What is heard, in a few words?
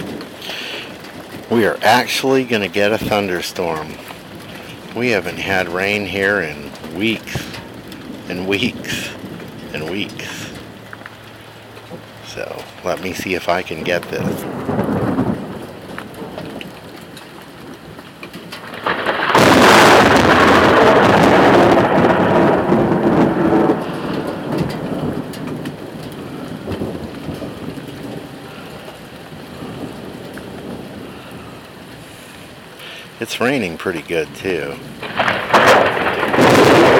thunderstorm